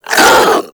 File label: Infested Charger Pain; Warframe